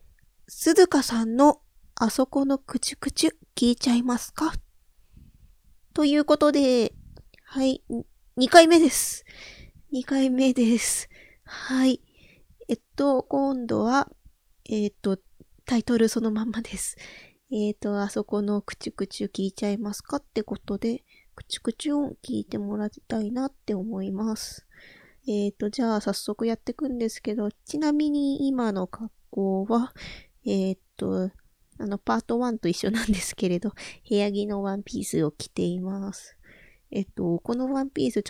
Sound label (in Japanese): くちゅくちゅオナニー